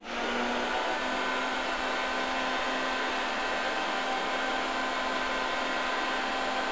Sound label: Aircrafts L-39 Roll Trimer; DCS